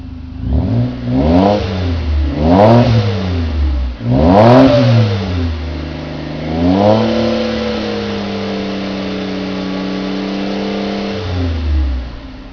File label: svt exhaust